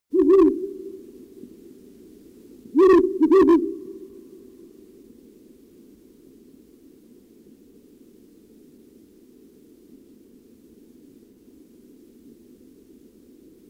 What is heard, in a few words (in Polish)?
Puszczyk Uralski